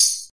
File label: tambourine simple; Western and Latin Percussion; single hits